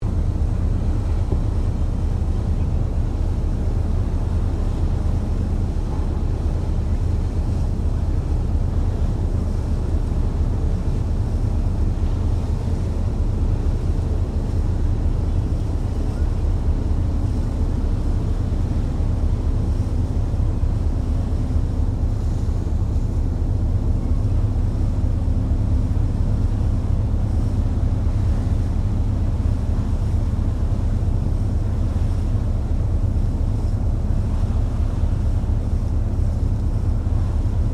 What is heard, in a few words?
Boat Ferry Freshwater Class Sydney General Ambience Stereo; Manly Ferry; Boats; Vehicles; SoundEffects-Foundation1